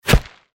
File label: bullet impact dirt